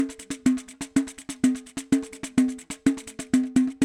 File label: Pandeiro 2bar